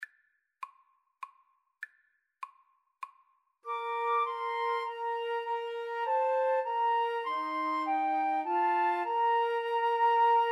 faith of our fathers, Flute Trio

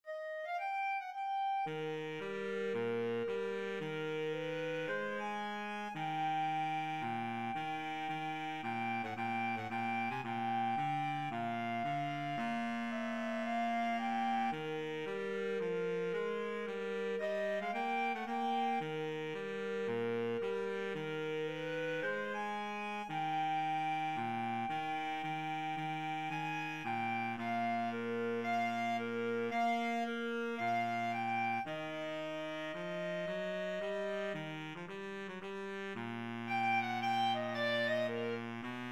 clarinet saxophone duet

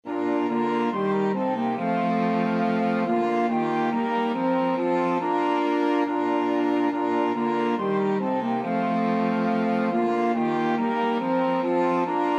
flexible mixed ensemble 4 players

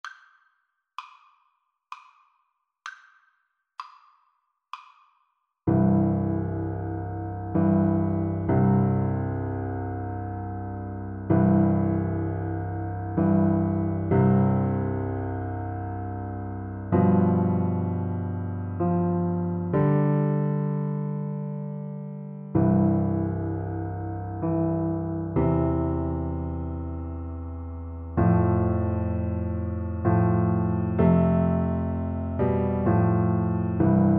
silent night, tuba

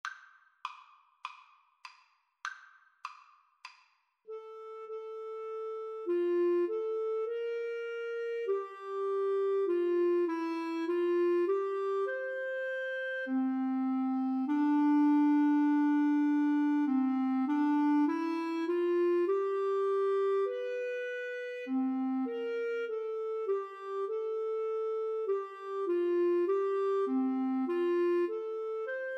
Flute Clarinet duet

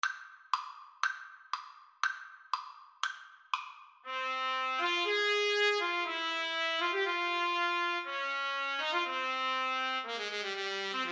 brahms hungarian dance 5 trumpet trombone

